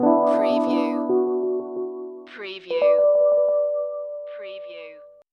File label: Hip Hop Dark Rhodes Licks 90 BPM Straight - E